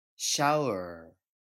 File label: shower